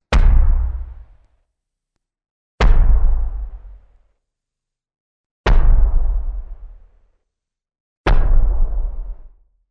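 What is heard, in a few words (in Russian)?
суровые удары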